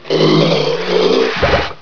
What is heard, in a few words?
tube die simple